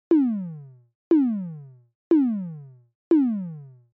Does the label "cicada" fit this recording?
No